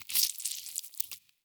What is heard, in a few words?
blood squeeze drip